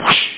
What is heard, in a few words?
accel; Greeblies